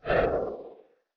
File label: Deep Breath